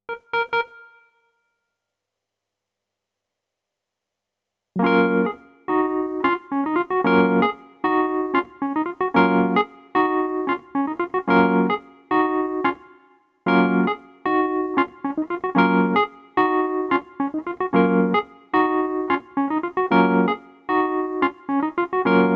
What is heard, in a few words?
keys